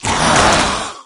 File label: evade right, maggot; doom3